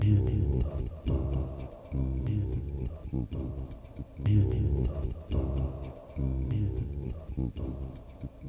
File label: 113 weird vocal thing, vocal loops; MELODIC LOOPS; VOCAL OR VOXY LOOPS